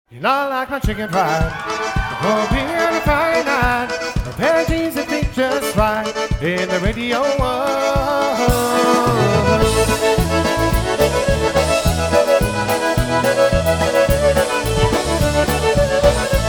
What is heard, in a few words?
Polka, Live Jam